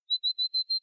distant bird sound; gamerip; Caravan Beast

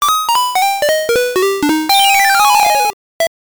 Zapped; The Tower of Druaga; GB

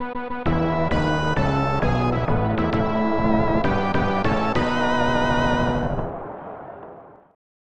Home Run!; super bases loaded 3; snes

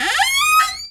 cabinet door squeak; a dump in the dark

